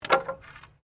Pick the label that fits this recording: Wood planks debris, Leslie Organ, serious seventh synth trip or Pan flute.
Wood planks debris